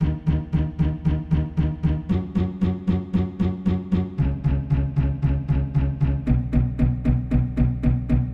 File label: Pizz Pluck 115bpm